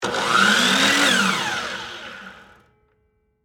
Miter Saw Run, Industry, Miter, Saw, On, Run, Off, Short; Saws; Machines